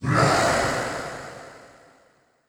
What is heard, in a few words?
Ice Death; Ice Death V O2